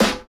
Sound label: snare, snare house